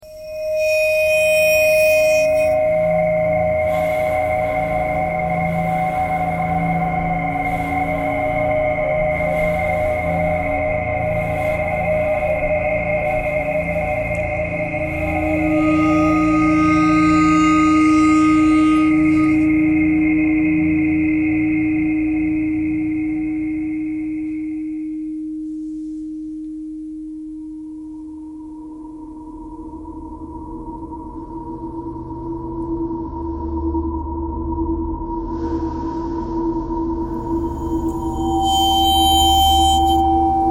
traffic k core birds ice bowed vibes thread pentatonic; bowedmetal